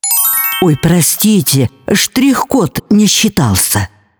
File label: barcode error